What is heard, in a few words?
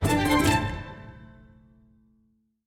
Music Ambiance Hostel Fight End; asterix obelix slap them all 2